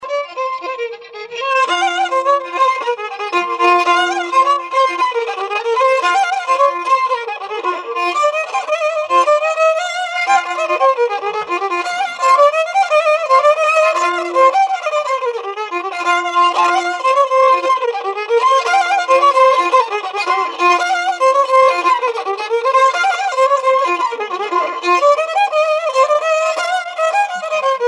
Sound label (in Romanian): Floricica la vioara; jocuri si cantece batranesti; Vaslui